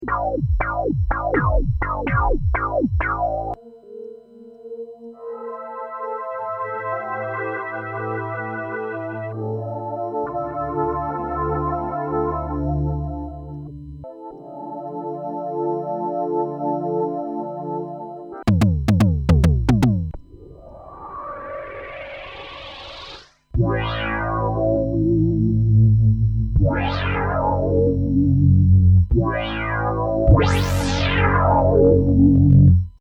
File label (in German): flaechenbrand, jx8p; moogulator